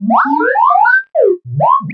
robot idle; Earth and Beyond; EA Games

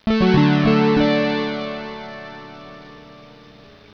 Fanfare; Leprechaun Demo V3.0

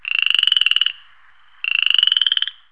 frog; ANIMALS

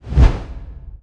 wings; Earth and Beyond; EA Games

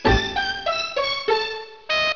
beep; 250 Desktop Themes

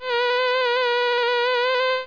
fly, helicop

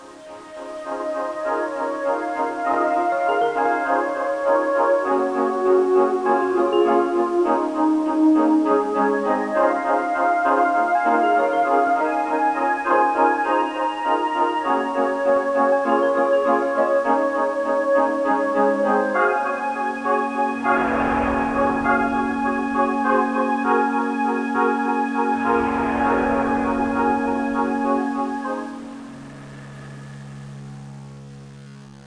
Timeline Song Soft; Let's Discover Massachusetts

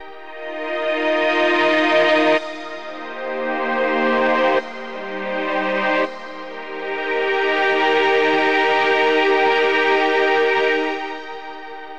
synth pad ambient 160 C; Sphere

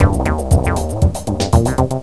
Fast Drum; Macworld (1999-03); Games World; Hot New Games; Cloudz 1.0.0

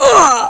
Soldier Death; BOOM

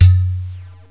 Ballon

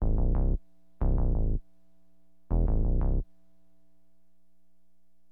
BASS, HipHop; LiveAct Pool

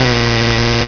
Buzzer; Lasso 2.5 Server

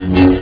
lt saber swing; MOTS

